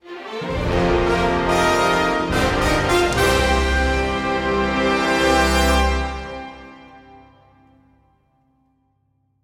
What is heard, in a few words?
Level Up Stinger